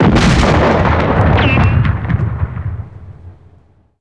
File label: Armored Unit Explosion; Red Shark